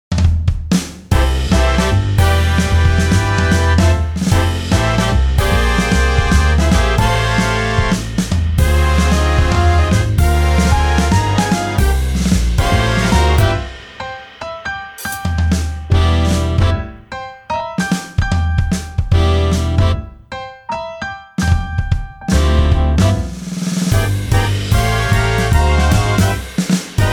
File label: Jazz